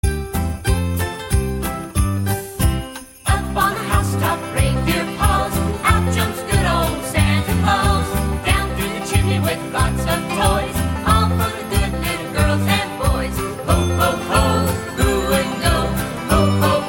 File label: jingle bell song ringtone